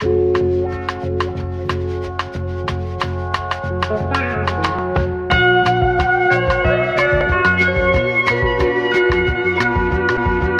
guitar, Phonky loops

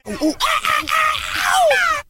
"ah ooh ah ah ah ow"; Voice; ooh ahh

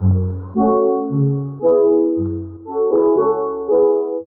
twiddling thumbs; Loops; Electronic

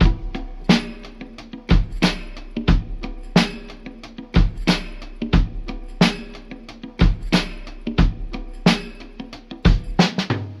tom battle, Boombap Drum loops

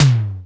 jingo, Toms Electro

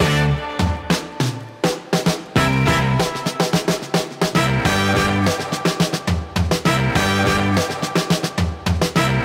full horniest horns, Loops, Phonky

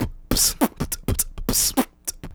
larpy, beatbox; Drum loops

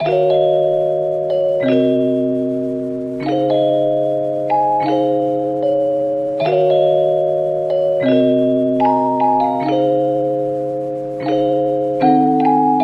keys, phonky loops

